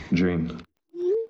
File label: text tone